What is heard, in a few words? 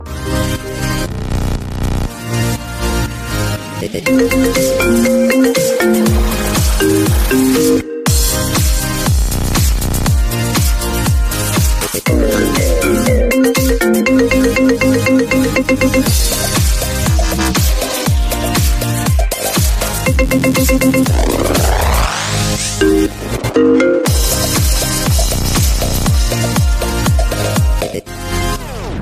IPHONE ELECTRO OPENING RINGTONE